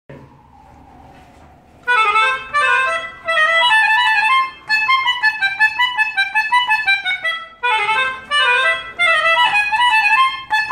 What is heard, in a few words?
Melodica; ساز ملودیکا